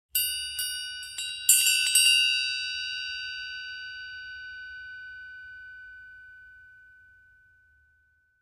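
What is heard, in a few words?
Elephant Bell Small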